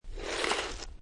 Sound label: Snow And Ice Ice Skate Slide; Weather